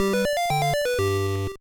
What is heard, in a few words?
Bonus Round Clear; Mario Bros; Super Mario Advance 1; Super Mario Brothers 2